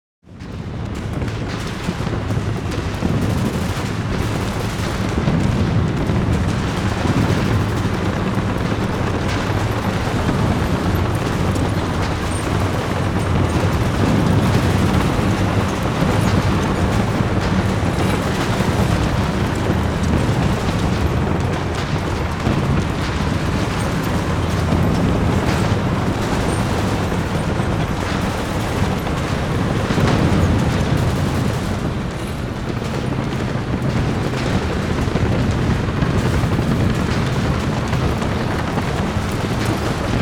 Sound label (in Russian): Pole boya voina